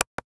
Technology Electronic Remote Control Button Pushing